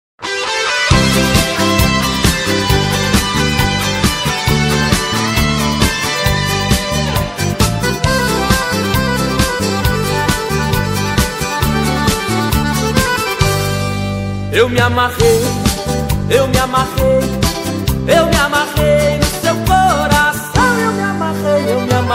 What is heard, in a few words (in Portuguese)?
sertanejo classico